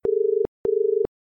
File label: Tone Early Media Australia